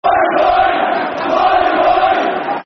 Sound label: Boing, Boing, Football Chants (Soccer Songs); West Bromwich Albion Wba